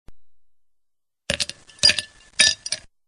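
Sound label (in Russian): кубики льда в стеклянные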